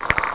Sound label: ball in cup, cartoon sfx